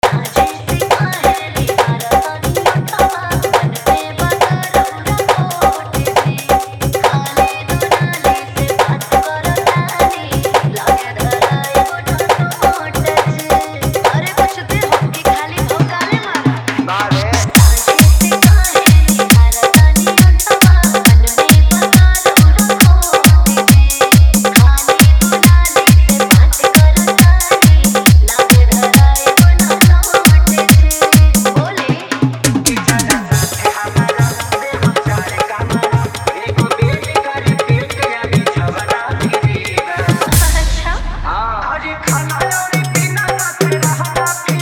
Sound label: Hard Dhollki Bass Remix